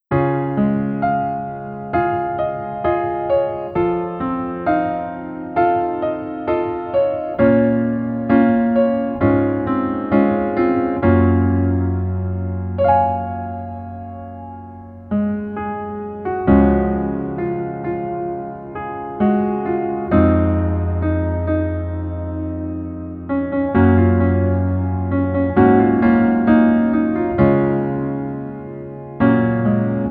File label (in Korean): Piano ver, 멜로디MR -1키